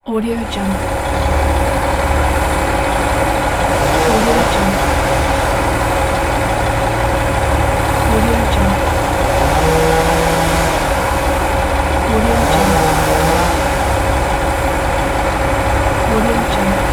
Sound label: chainsaw at construction; asepticsounds